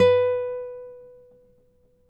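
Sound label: nylon, FLAMENCO, Guitars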